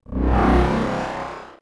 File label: fade in; Sphere